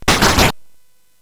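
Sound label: Nebulus, MOS 8580 SID chip music, games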